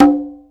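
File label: HI CONGA SLAP; HR-16 DRUMS; Greytsounds VOL-1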